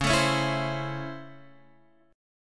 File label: C sharp Minor Major 7th Sharp 5th C sharp, chord